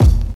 low bass drum sound c key, kick single shot tuned to the C note